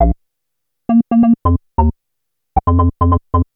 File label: Bass, Futurist Drum & Bass